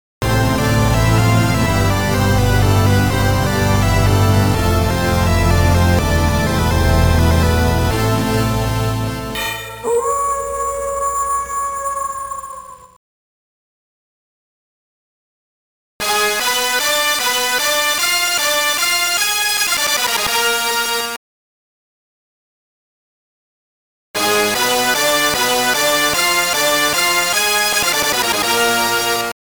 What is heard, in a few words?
Game Over